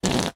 cartoon whoopee cushion air release fart

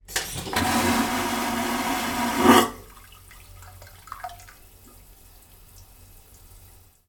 transport boat ship ferry toilet flush

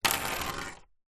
office pen plastic biro roll on table; zapsplat

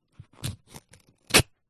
foley paper cut slice open knife letter

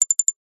cartoon knocking high pitched delicate glass

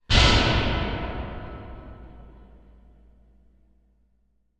sound design big horror hit metallic cinematic

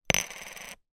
foley token plastic down spin surface

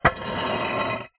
foley roof tile slide on another; zapsplat

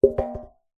tin metal cylinder lid on knock over on carpet; foley; zapsplat

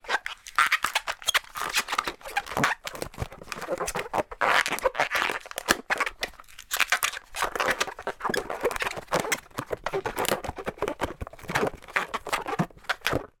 foley balloon modelling twist squeeze movements

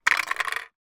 empty drinks can roll or drop on street; foley

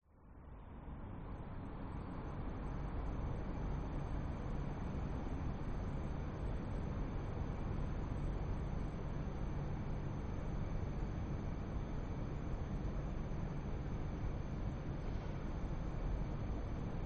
Night City Ambience With 3 Cars Passing By; west wolf

